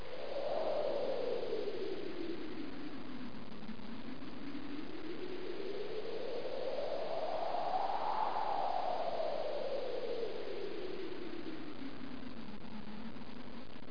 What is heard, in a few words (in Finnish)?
TUULI